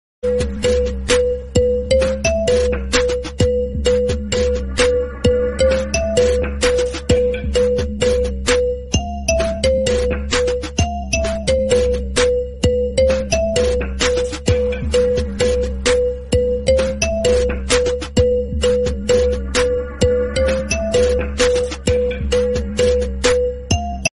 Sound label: bgm